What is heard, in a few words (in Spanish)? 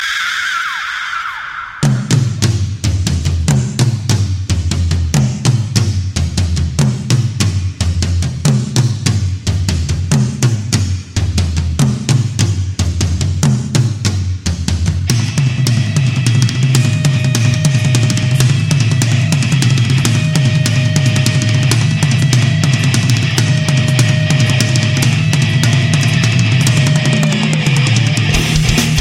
tambores de fuego